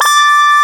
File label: SYN, SYNTHESIZER; DIGI WAVE #1